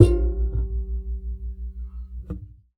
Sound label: Classical Guitar Extended Technique